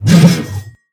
swing; smite; chaac

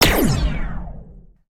basic fire; smite; Anhur

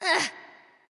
NV Ouch; smite; awilix

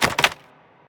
mvt armor; smite; arthur skin9

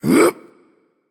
grunt lift; Smite; camazotz

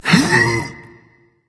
grunt lift berserker; cuchulainn

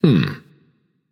notice; smite; achilles

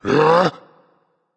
breath berserk; cuchulainn

